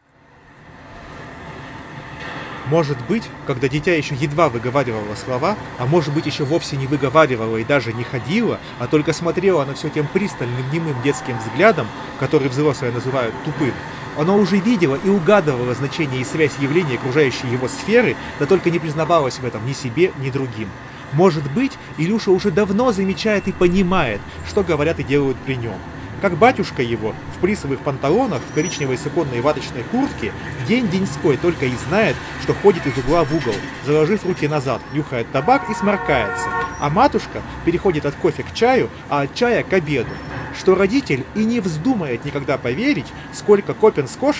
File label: Recorder mic int noise; noble audio fokus apollo pro